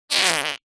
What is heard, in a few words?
cartoon fart loud squeak